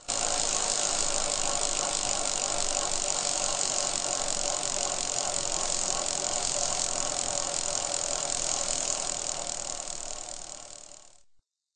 spin

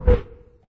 whoosh fast

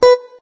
note beepey

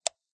click plastic single

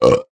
burp

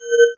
stack bright

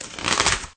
tear long rippling

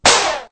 neg

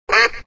animal duck

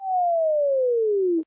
bricks disappear

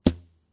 thud short

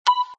tone

